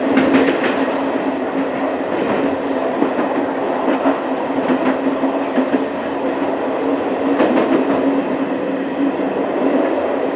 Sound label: Run, Train; OpenBVE